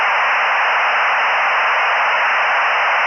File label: 14 400 Bps Modem Connect Telephone Rings Line Noise Modem Connect